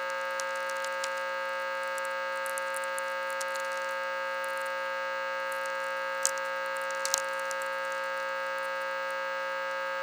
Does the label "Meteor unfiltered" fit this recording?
Yes